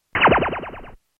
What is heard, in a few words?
6.4k 4ADPCM effect